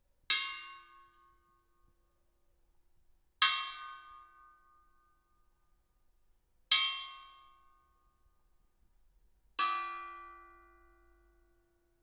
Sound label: Barrel Ting